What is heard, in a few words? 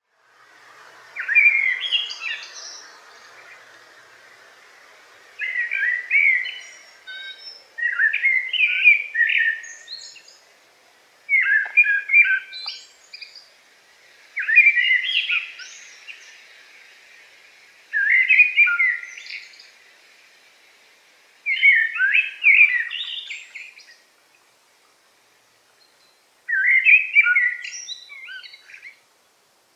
blackbird forest